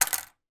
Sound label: office scissors small drop on table